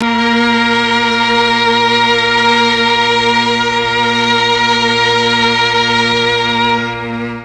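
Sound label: A#2 MIXED STRINGS, Mellotron; Mike Pinder - Mellotron Archive CD; MIXED STRGS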